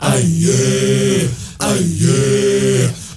MALE, HUMMERS

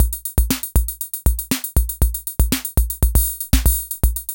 DISCO, KR55; INIS; Funky Xtreams

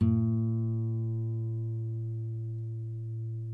NYLON NO VIB, Guitars; Spectrasonics; Hans Zimmer Guitars VOL-1

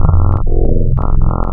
MOOG MICRO, wavetables, Classic Synths

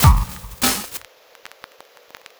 drbreak, drumbreaks; Masterbits; Vinyl Attack

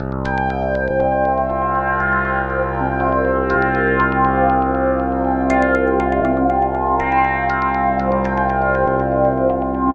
Dreamy Drone LFO C 120, Ambient; Trance Formation

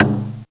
Perc Wadaiko Casio SA-1

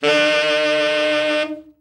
TENOR, TENOR SAX; Best Service; Brass Super Section

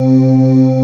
STRING PIZZA; SONIC ELEMNT; SONIC EL YES

